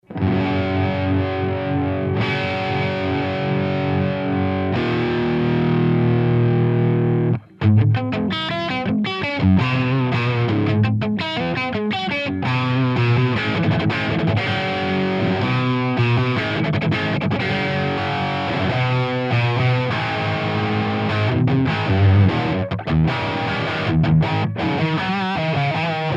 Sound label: Engl RB Tuning crunch